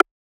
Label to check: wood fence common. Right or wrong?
Wrong